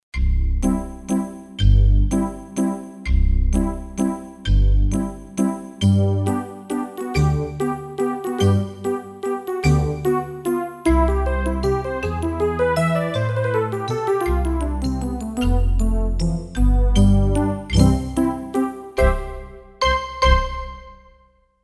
Huff N Stuff, Roland JV-880; Factory Patches

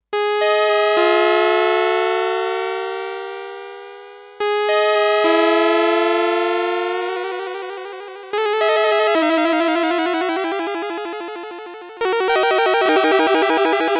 Ascending release, Sequential Circuits Six-Trak; Factory Programs

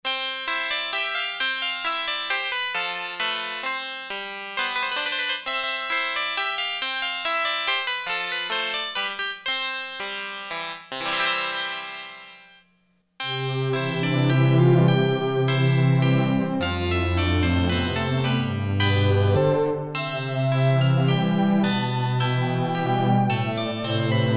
HARPSICHORD, Roland Jupiter-8; Factory patches

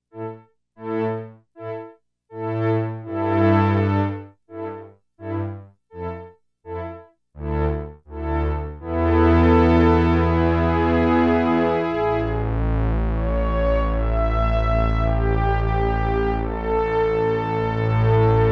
Strings IV

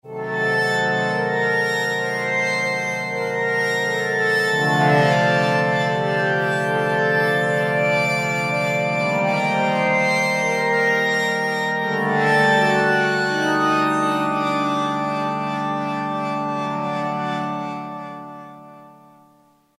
Sync Pad; Roland M-DC1

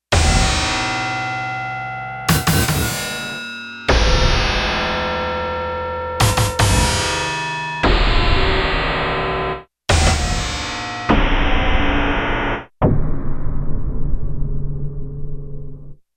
Gated FX; Digital Synthesizer

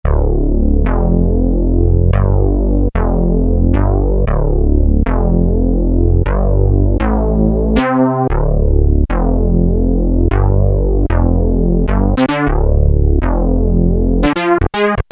Modular Moog, Roland JX-3P

